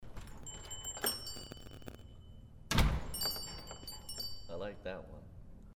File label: Door Opening